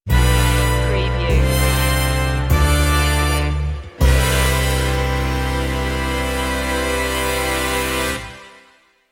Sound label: big band romance stinger